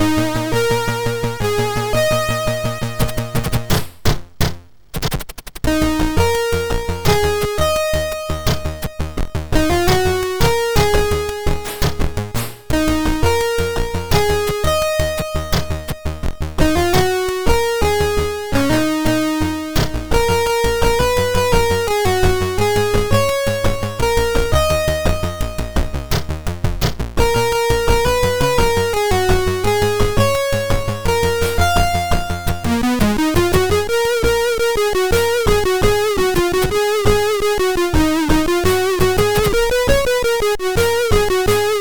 sid, MOS6581R4

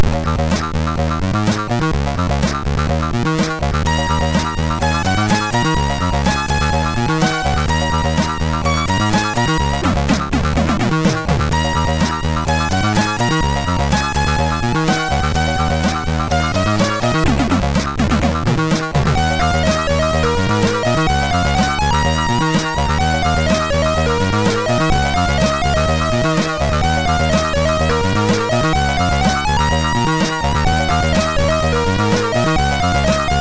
sid MOS6581R3; HVSC

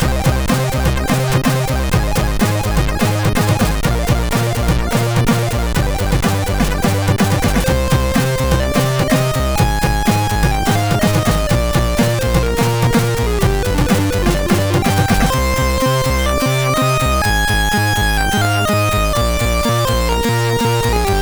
Intro Tune, MOS6581R3

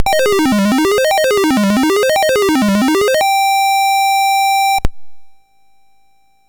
Dragonworld sid MOS6581R4

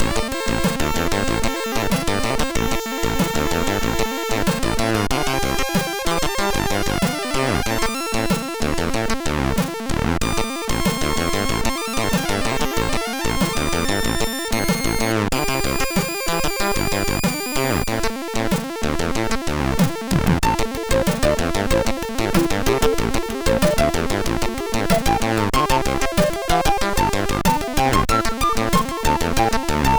Sweet Noise note, MOS6581R3

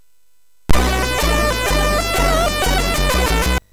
Music Demo Version, MOS6581R3; hvsc

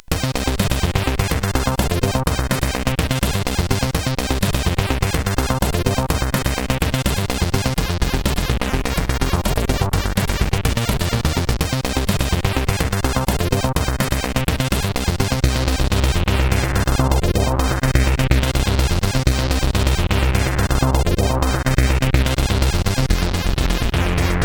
Last Heartbeat, sid CSG8580R5

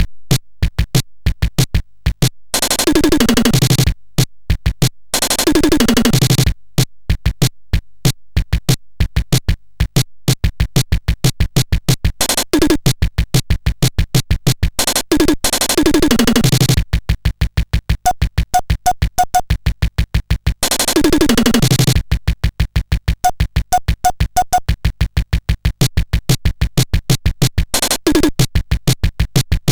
sid MOS6581R3; hvsc

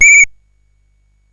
Paddle Mania, CSG8580R5

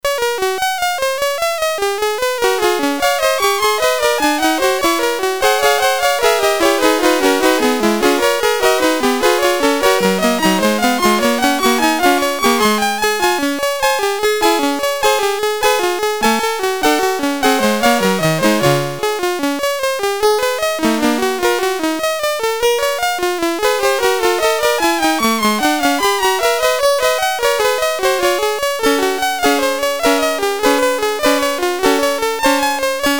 Gigue G Major, CSG8580; CSG8580R5

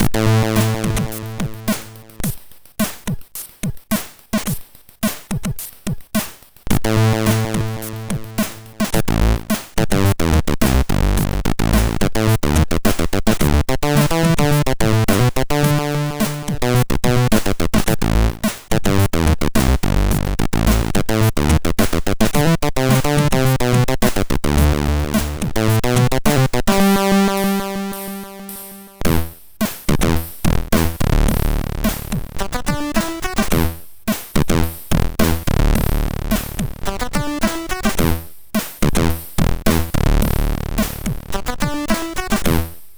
Funkt, sid MOS6581R3

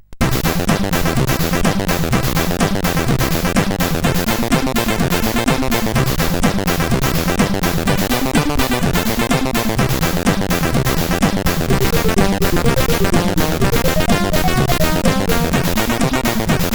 Rock Line, sid CSG8580R5

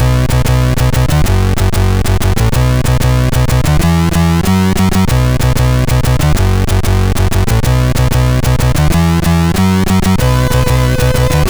Techno, MOS6581R4

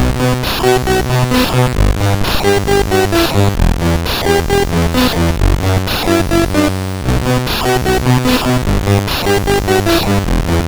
BASIC, CSG8580R5

